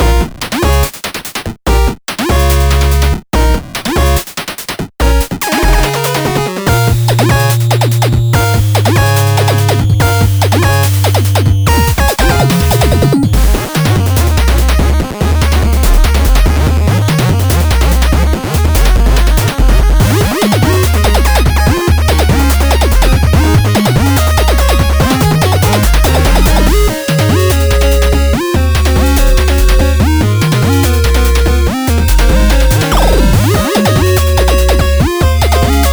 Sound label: 8bit Boss; Radirgy 2